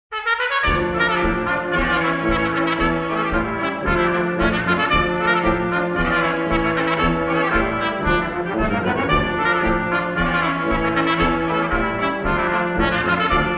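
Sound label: karelo finskaya polka, brass band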